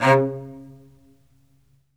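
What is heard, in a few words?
solo cello, strings; ILIO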